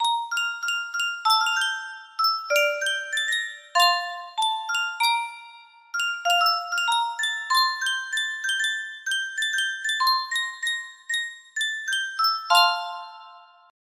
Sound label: Sankyo music box, music box melody